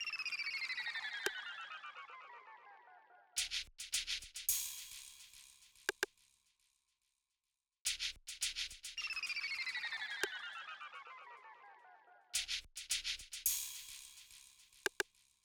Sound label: Percussion, 107 BPM; G Min; Stems; Cymatics; Cymatics - CHAOS Beta Pack (MIDI + Stems); Santa Monica